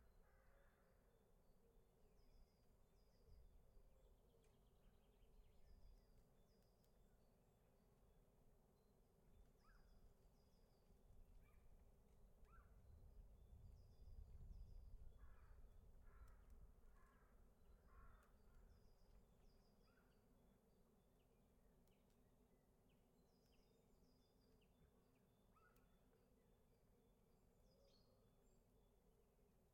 Suburb Outskirts Forest Edge Outside Summerhouse Many Birds Wind; 7.1.4; Atmos; MKH; Pole Position; Ambiences for Atmos Vol 2